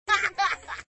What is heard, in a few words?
Kid Laughing